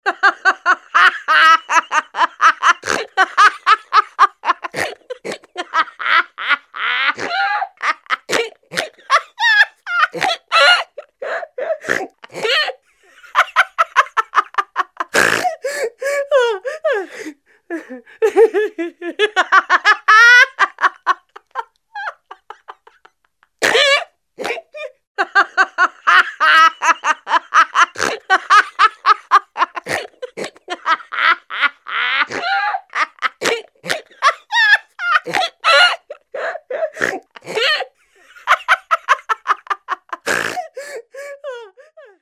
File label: Woman Give A Neighing Laugh Grunt